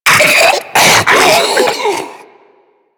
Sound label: Infested Diseased Ancient Charge; Warframe